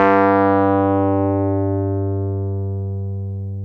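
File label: piano, Wurly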